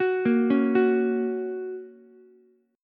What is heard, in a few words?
A sharp Minor Sharp 5th down Ukulele, A#m#5 chord; arpeggiated; JGuitar